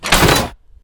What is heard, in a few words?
button, doors; svencoop; morass beta